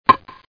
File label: Button; New York Yankees: Interactive Yearbook